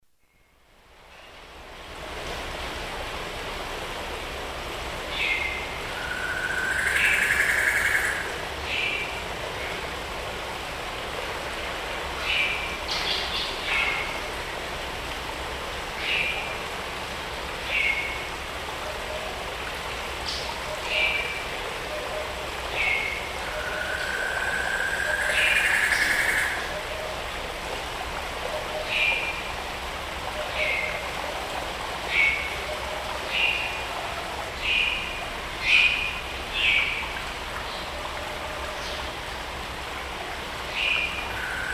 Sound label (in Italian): Suoni dalla foresta tropicale